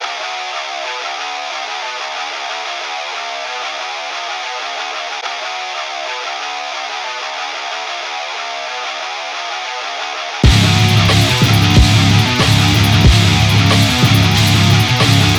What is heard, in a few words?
Riff